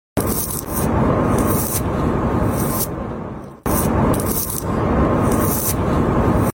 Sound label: brushing eyelashes